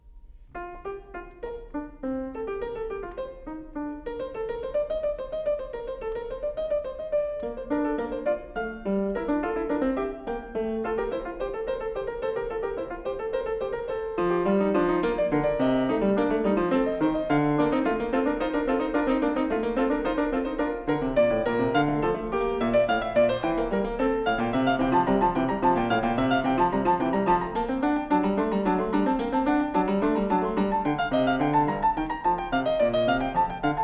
Fugue No. 21 in B-flat major, Bach, Well-Tempered Clavier; BWV866